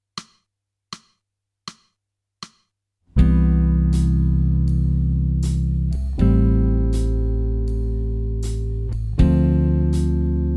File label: jazz